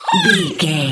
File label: goblin fairy attack